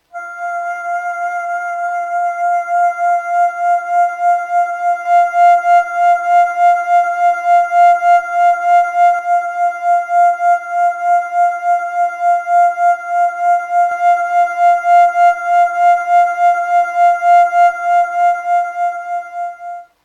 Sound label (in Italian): Flauto senza 12 bit e con 12 bit; Sonicware; Liven Lofi-12